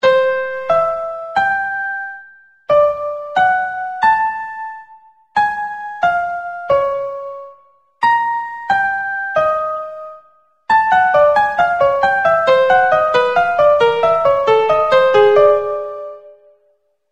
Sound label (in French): triades maj exo; theoverblowers